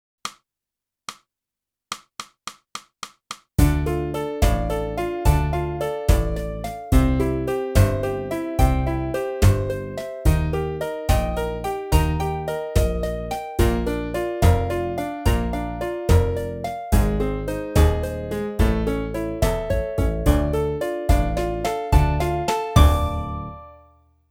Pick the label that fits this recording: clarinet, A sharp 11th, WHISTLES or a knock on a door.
clarinet